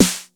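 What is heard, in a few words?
909 snare drum, TR-909; Crypton - DrumBase5000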